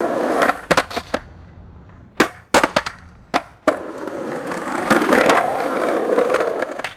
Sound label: skateboard ollie jumps and lands on cement